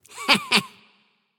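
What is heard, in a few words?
"heh heh", nonverbal; smite; voicepack; khepri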